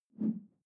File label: whoosh simple short tonal low; swooshes-swishes